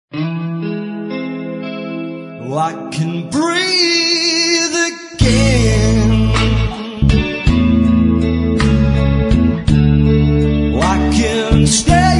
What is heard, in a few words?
Blues